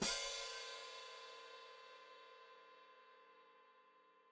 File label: Hat Closed Open, Hi-Hat